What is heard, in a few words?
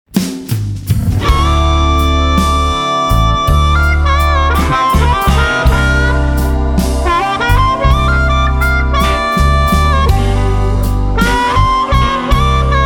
Blues